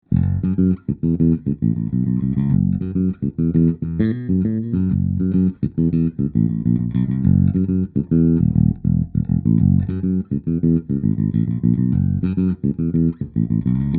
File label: Detroit basslines